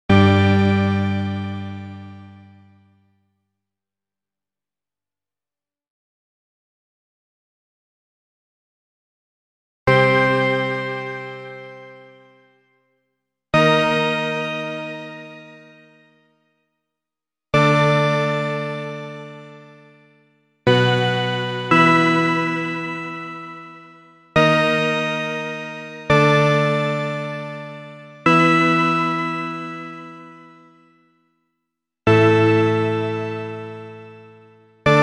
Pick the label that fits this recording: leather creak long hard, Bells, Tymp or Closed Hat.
Bells